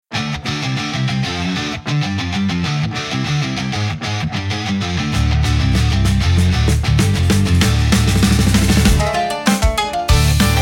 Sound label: Blade Over Thunder, intense japanese rock